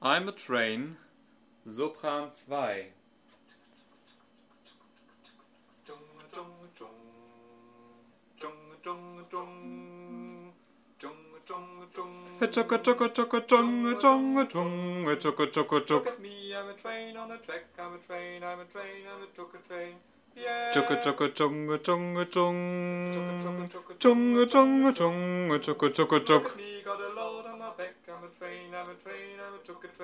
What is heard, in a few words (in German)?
Sopran